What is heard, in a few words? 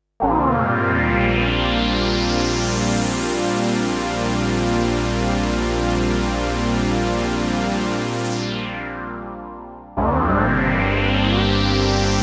7 note chords; crowbx